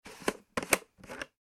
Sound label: household tub plastic lid off; Plastic Lid Removed / Unscrewed From Tub; Kitchen; Plastic Lid Removed From Tub 3; McKinney Sound